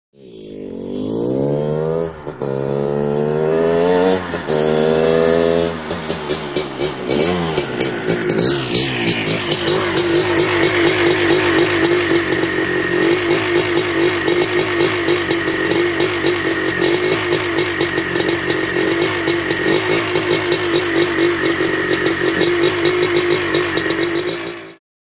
Motorcycle; In / Idle; Trials Bike Up To Mic And Idles.